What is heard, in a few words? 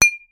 Bottle Cling